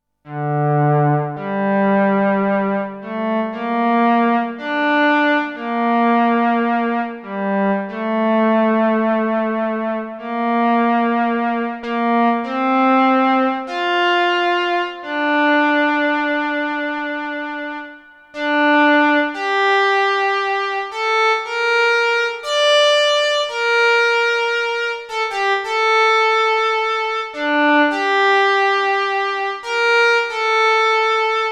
jx8p cello

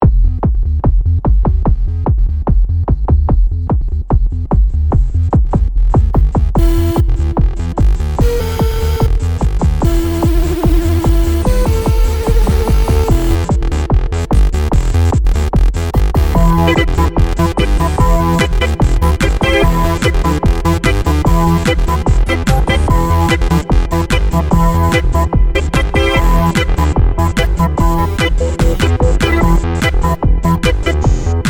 MemoryMoog Electro Dance

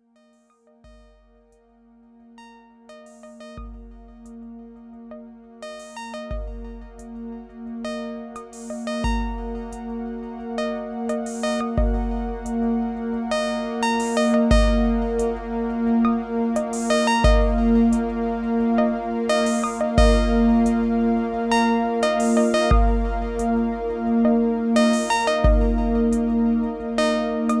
TR808 Solaris; RetroWave

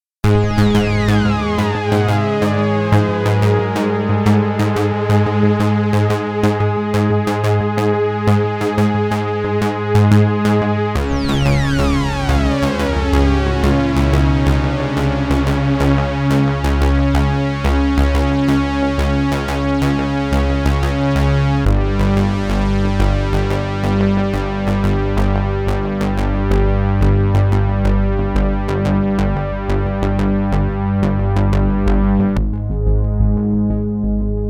A8 Velocity Solo

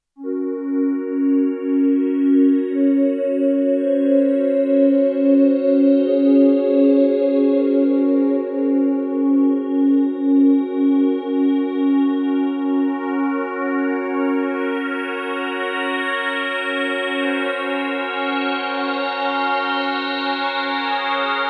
Modal A8 Warm Digipad

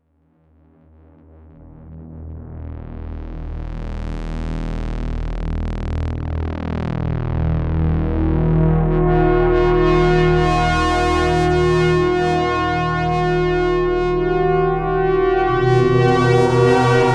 MemoryMoog Not Tuned

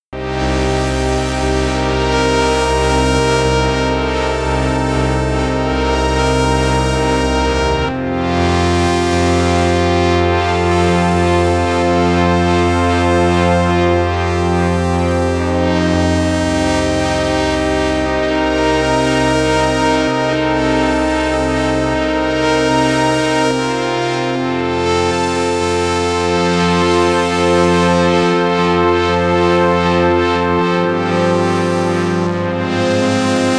Pad; FredsLab

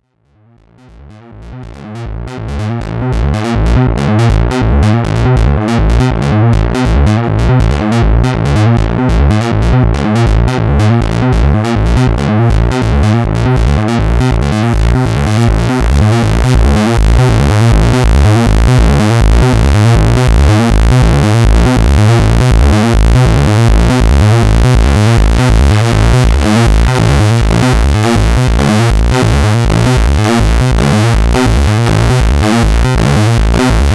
Sonic Six Bass, synthesizer

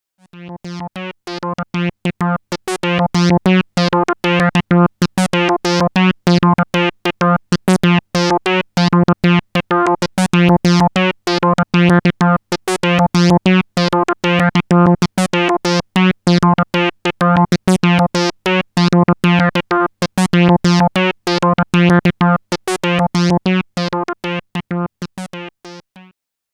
Seq D

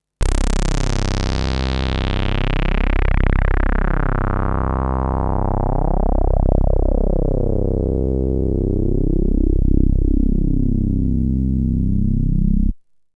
VCF in mid reso, synthesizer

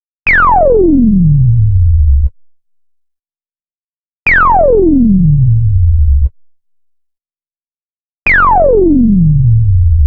SQ Bass, synthesizer

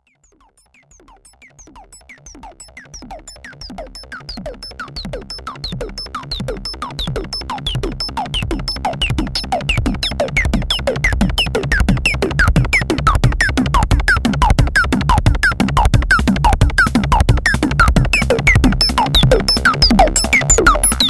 MiniBrute Resonance

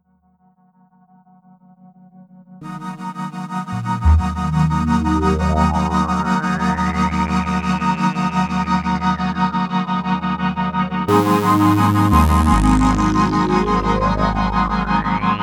two voice pro jh3200

